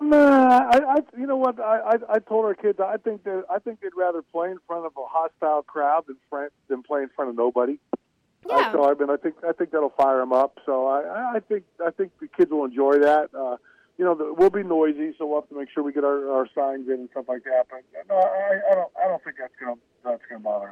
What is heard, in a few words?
Hostile Crowd